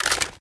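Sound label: equip movement; cstrike; valorant; prime vandal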